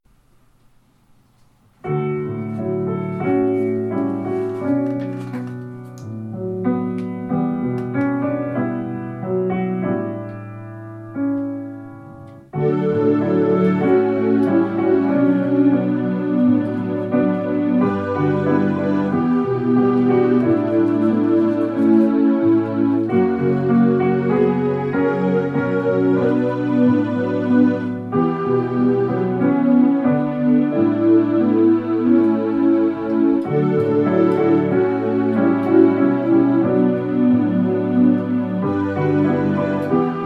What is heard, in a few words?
SAB midi voices with piano accompaniment; Peace I Leave With You